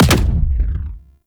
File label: Exalted Sniper Alt Fire Punch; Frumentarius